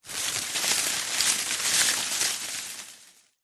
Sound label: foley tree branch thick healthy leaves movement rustle short